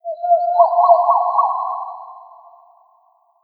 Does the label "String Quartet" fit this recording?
No